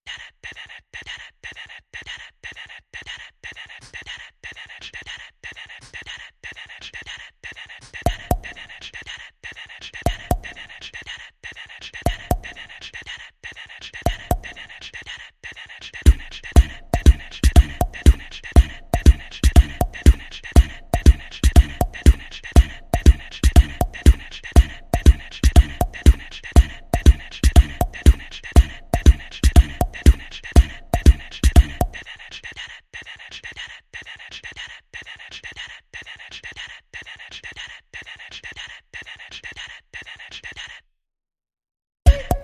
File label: Good Drums Full